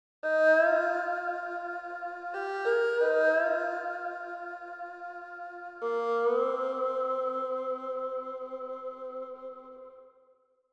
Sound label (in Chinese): 二胡独奏